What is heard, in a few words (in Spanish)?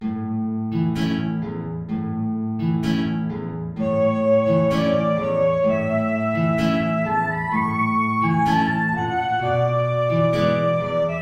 tango